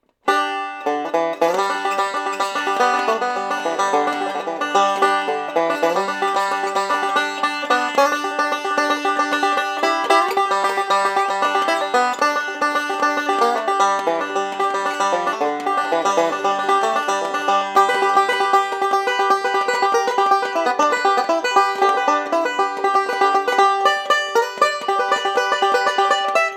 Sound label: Banjo